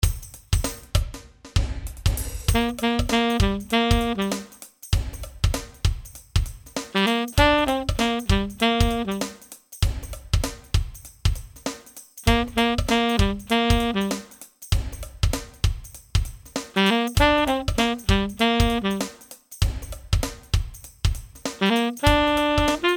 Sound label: Tenor Sax